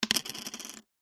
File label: token plastic set down wood slight spin; foley